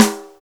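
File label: SWITCH snare, drums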